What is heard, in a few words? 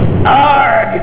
arrg